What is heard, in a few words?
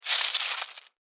Cockroach Run, Cockroach, Run, Scurry, Movement, Dirt, Insect, Bug; Blastwave; Animals:Insects